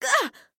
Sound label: sniper negative vocalization; Kinessa